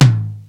tom; E-MU Formula 4000 Series Vol. 1; Hip Hop Nation; Bonus Perk

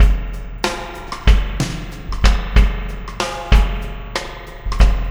Landing Party Beat, Processed Drumkits

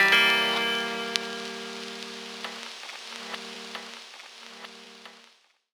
GTR LOST, Gbm; Rawcutz - Nu Forms

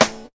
combi drums

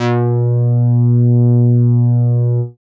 CONCRETE BASS; Masterbits; Dance Trax

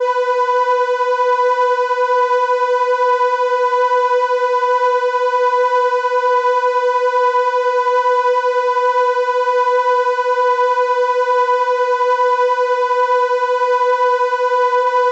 violin B4, ARP Solina

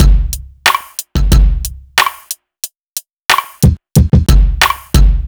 Drums, Cinematic Funk; Sonic Foundry (Sony Creative Software); Cinematic Funk Urban Tone Poems

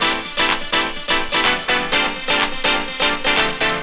LOOP, Techno Trance; Music Loops

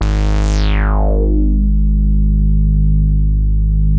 G#2, Bass; E-MU Producer Series Vol. 6 – Analogia Project (CD 1); Boom da Bass 2

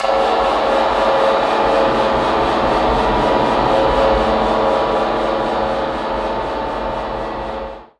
Verb Proc, alarm siren, 120bpm; musicradar

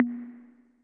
snap, Yamaha Tenori-On Snapkit

